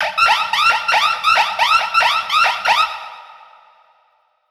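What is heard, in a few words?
alarm siren, reverb processed, 170bpm; musicradar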